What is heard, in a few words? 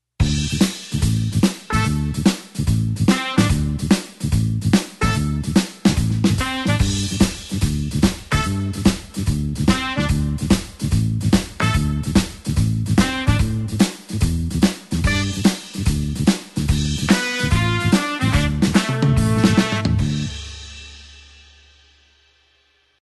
blues horns shuffle D